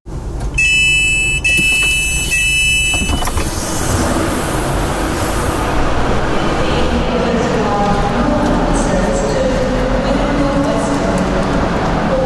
Stepping Off A Train, Inside a train arriving with automatic doors and station ambience; Trains (Sound Effects:Vehicles)